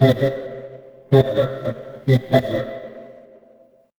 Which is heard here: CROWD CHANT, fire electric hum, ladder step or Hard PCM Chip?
fire electric hum